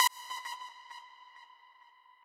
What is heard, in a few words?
lead